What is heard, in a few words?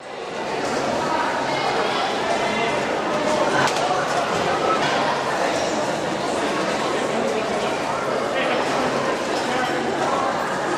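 Crowd Loop High School, hallways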